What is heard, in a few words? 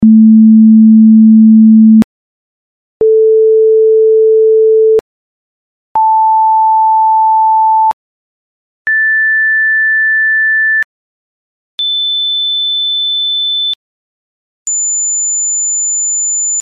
octaves